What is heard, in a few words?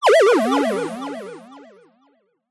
cartoon descend wobble delayed; zapsplat